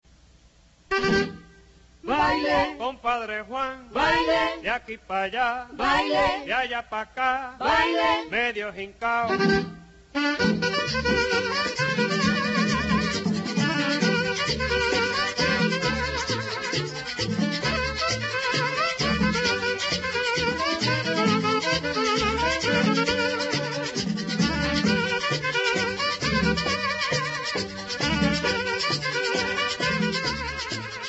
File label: merengue